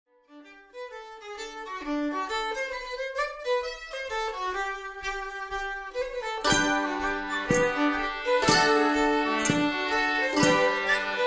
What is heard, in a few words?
lincoln hornpipe